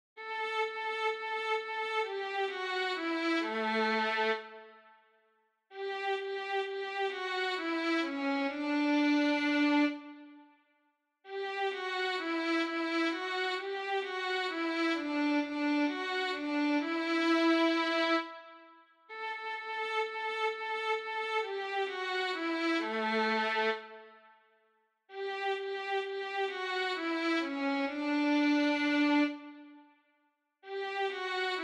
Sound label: Karaoke